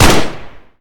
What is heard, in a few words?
galil; cstrike; ZP CRISTIAN; ZPB WEAPONS